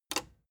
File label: plastic button small simple push